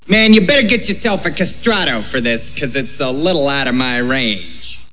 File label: castrato